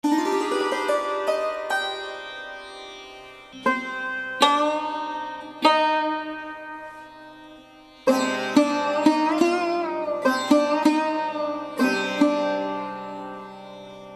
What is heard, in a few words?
instrument sarod